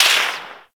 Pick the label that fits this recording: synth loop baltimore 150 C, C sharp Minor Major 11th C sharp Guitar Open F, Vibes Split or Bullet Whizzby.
Bullet Whizzby